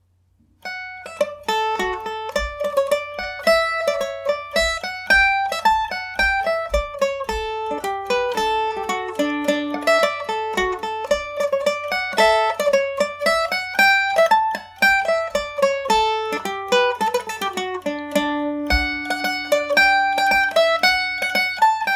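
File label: D major md304, Irish mandolin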